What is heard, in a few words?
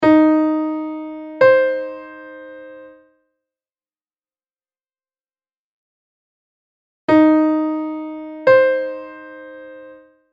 Intervals; Practice Exam Example 1